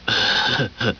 huh huh

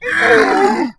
zombie pain

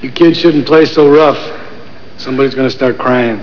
kidsplay

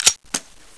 colt clip out; dod

